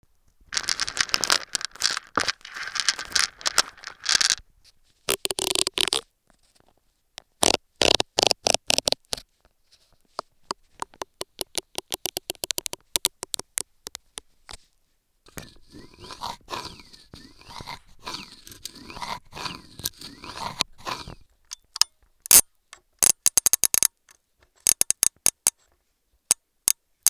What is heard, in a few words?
contact mic balanced